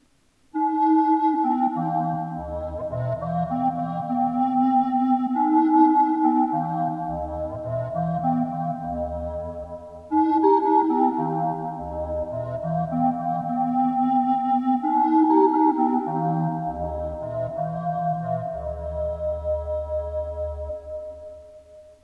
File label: ring modulator